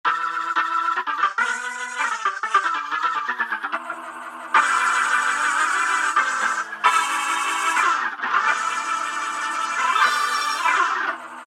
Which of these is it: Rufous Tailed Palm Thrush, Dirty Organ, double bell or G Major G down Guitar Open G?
Dirty Organ